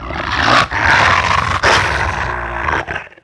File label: setian die; DAoC